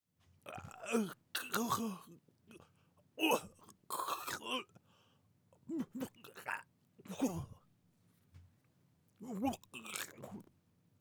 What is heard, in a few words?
Drowning Man